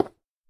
stone, dig; ayuncraft-1.5

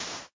sand, step; Eaglercraft; eaglercraft-b1.3

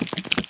step, spider; minecraft; mob

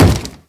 wood, mob zombie; ayuncraft-1.5

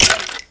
hurt, skeleton; minecraft; mob

